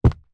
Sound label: step; chat grid